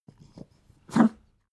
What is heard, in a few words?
pug smack gag; natur-tiere; hunde